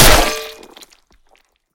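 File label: sharp metallic hit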